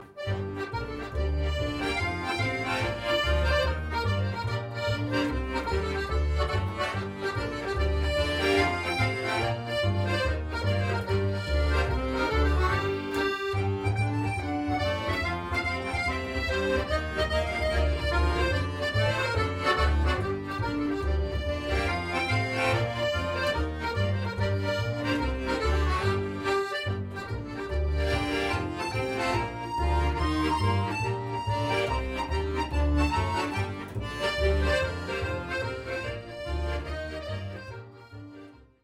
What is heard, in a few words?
jig; boulanger